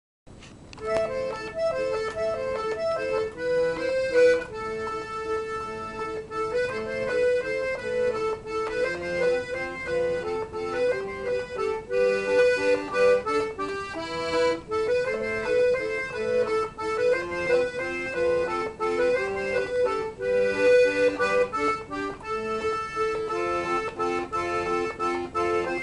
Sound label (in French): accordeon cover